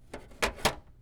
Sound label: Plastic Clatter; BB Retail Therapy Sample Pack